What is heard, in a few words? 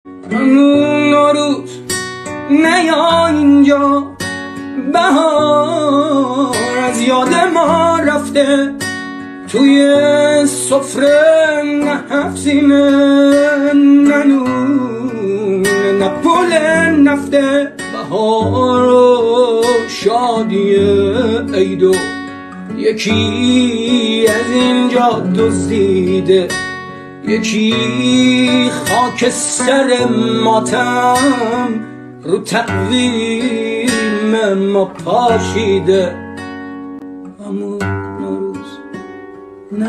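gitar, guitar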